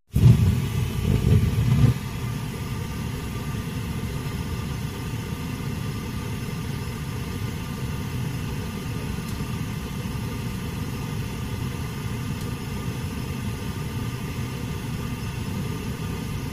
Gas Oven Lower Oven, appliances

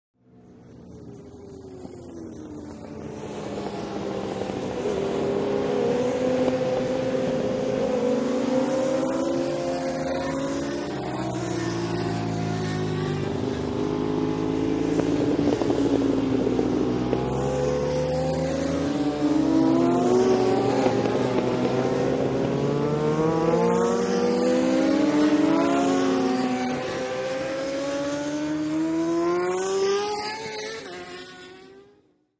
Motorcycle Road Racing

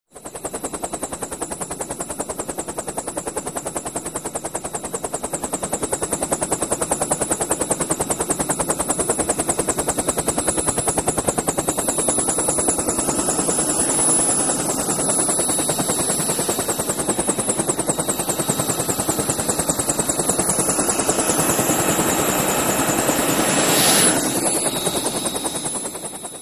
Helicopter Blade, blades

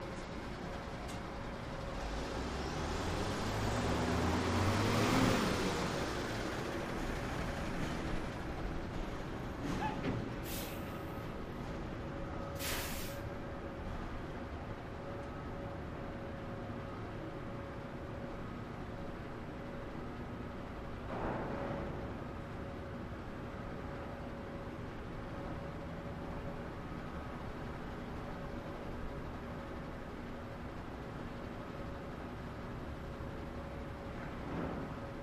port unloading; ambiences

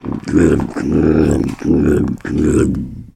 Bobcat Growls Low Lip; big cats

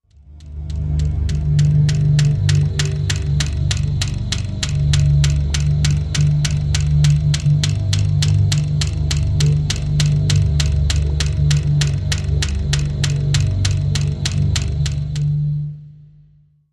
Phobia Counter Machin, mechanical

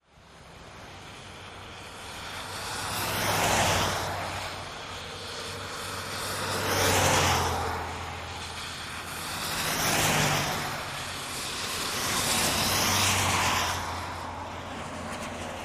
driveby rain, wet traffic

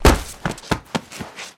Body Falls On Cement

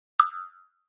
Pop Up High Pitch Tap

